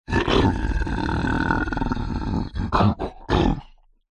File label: horror monster creature growl snarl; zapsplat